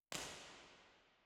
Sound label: First IR Sala Dodecec Center ORTF; Santa Cecilia Roma; Measurements 2005; Soundfield